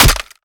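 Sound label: melee character gun medium steel; viper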